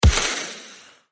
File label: snake Die; Legends of the Hidden Temple - Unlock the Past